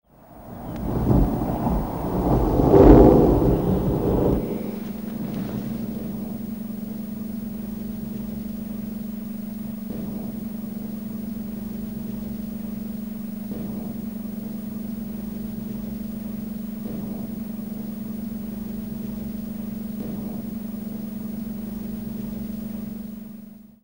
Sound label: Lair ambience; Airwolf